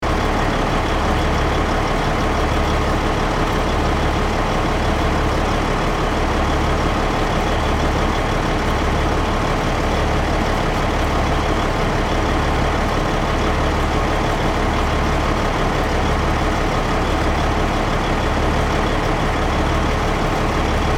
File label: Bulldozer engine idle loop